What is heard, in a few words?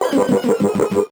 VocoBit Noise, 8-bit; musicradar; 8-bit bonanza